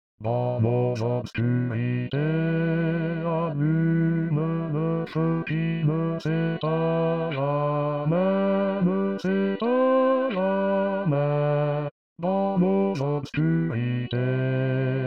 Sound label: SATB Bass